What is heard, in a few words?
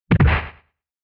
SNES Fighting Hit